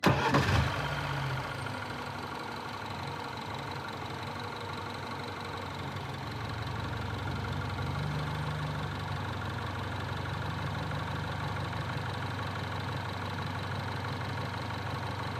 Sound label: BMW 114i Engine Start Idle Stop; E87; SoundHolder